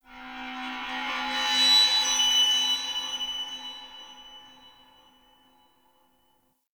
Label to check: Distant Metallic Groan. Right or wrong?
Right